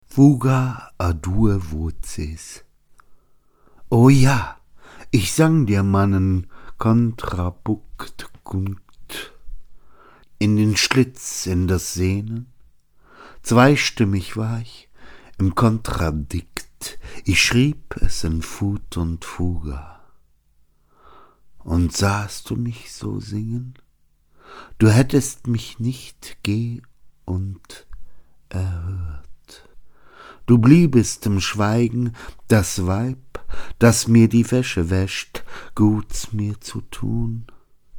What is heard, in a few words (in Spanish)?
fuga a due voces